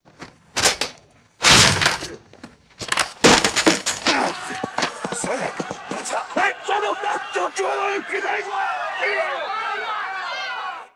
hard impact with scuffling and angry crowd; foley

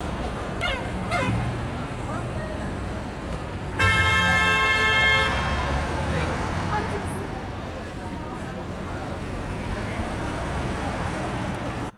city traffic with loud car horns; ambience

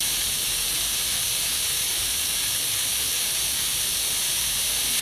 steady airy gas hiss through pipe

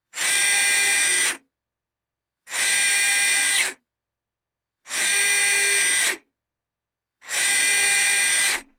short buzzy metallic servo motor whirs

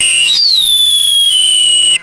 retro sci fi positive ui beep

